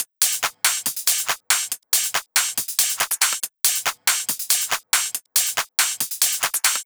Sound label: drum Loop 140BPM Full; Vengeance - Essential Clubsounds Vol.5; VEC5 Drumloops; VEC5 Multi Loops 140BPM